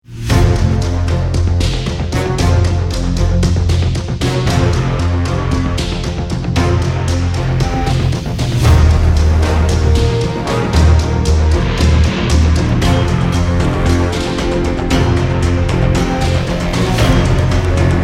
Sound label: start round, music; CS2; Counter Strike